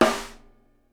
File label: SNARE, Live Snares